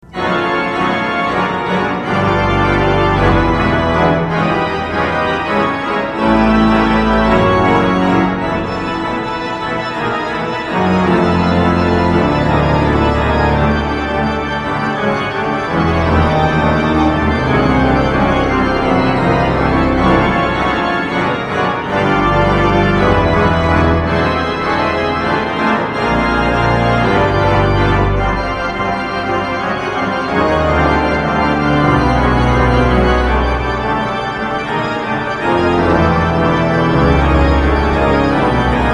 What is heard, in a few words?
Toccata, organ